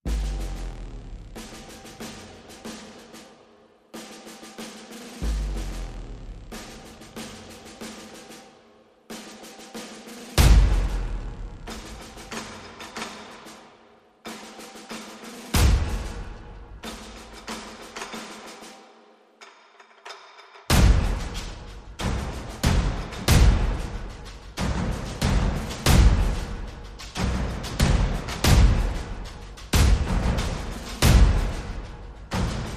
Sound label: Perc and FX